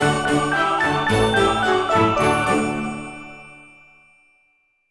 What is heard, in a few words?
Fireworks Music Bronze Chest